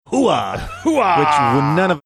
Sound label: hooaah hooaaah